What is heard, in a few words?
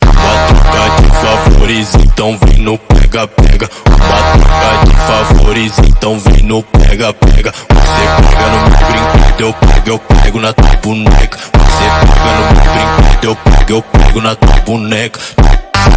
phonk automotivo sped up